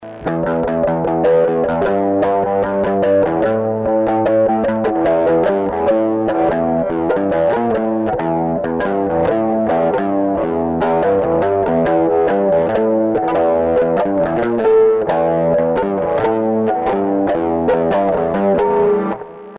basso

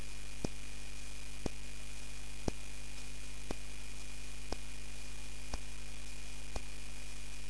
pull

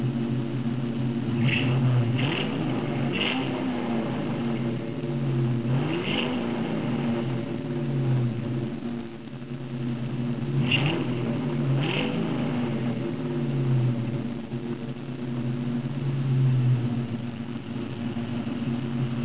concorde; concorde flowmaster

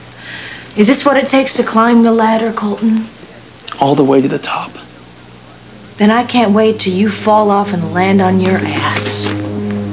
falloff

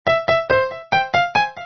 piano

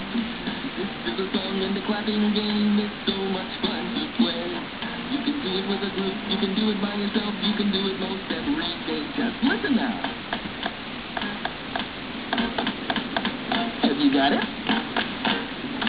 Claping; Barney

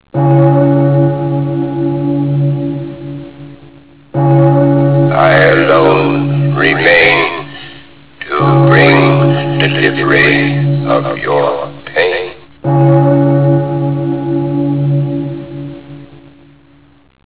bells

